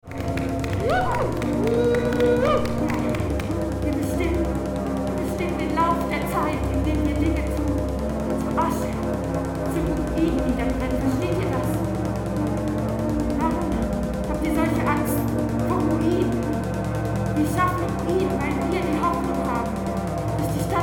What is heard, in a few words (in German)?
Noise Das Rauschen der Menge